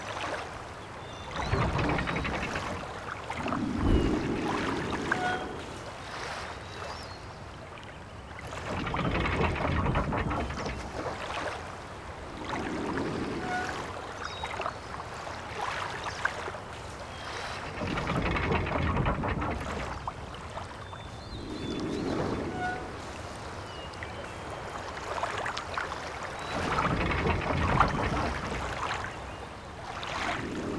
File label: Windmill